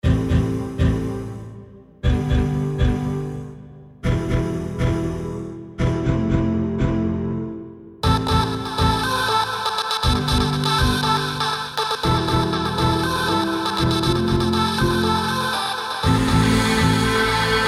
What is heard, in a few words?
Indie Vocals Mix